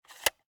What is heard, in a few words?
Viewmaster, plastic, lever, release; Eiravaein Works; Vaeyan IV